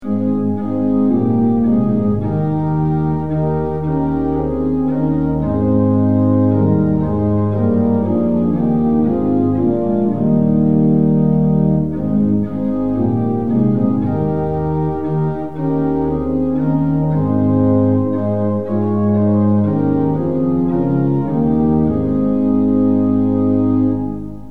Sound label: tune; hymns; lillenas